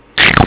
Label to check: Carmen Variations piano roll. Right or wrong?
Wrong